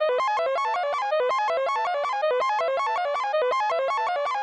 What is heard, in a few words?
mind control wave loop